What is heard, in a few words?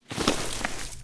Tenno A Stand; Warframe; Cinematics; Lotus Intro